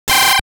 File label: laser; famiconse